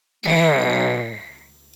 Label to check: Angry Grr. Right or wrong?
Right